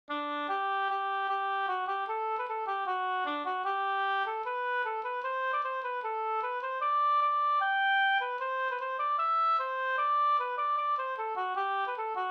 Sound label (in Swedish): reinlender fr Norge